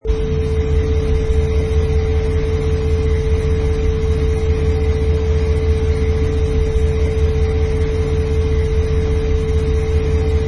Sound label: 1941 Ford with a 302 CI; bored .30